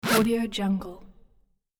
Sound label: zipper quick pull; monochromeeffect